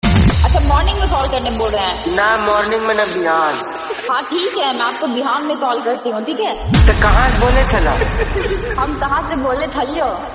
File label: nagpuri ringtone